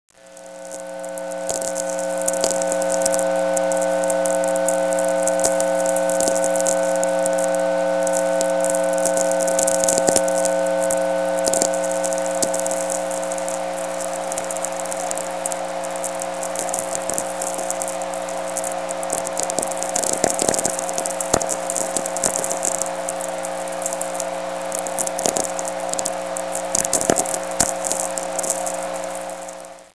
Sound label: reverb